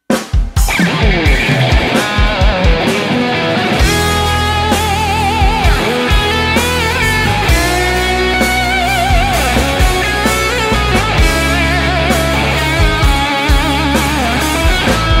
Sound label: 80's style improvisation guitars backing track; Igor Paspalj; Guitars Playbacks backing track